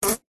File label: insects fly trapped short wing buzz; animals